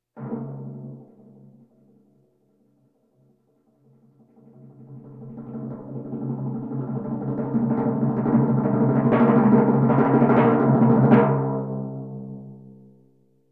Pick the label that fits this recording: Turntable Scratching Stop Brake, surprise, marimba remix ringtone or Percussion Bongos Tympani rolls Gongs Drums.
Percussion Bongos Tympani rolls Gongs Drums